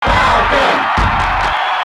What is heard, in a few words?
Cheer International; Captain Falcon; SSB